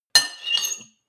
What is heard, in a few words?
cutlery on plate scrape squeak; berufe; gastronomie; soundpool